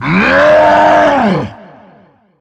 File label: gravi attack; sven; russian sounds; burer